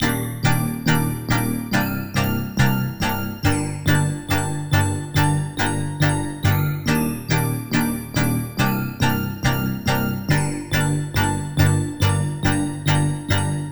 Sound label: Chord Loop, 140 BPM G Min, Synth; Future Bass; Chord Loops